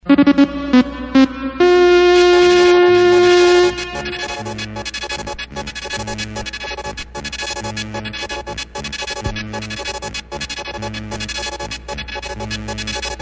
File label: news sounder 1960s; American Contemporary Radio Network; ABC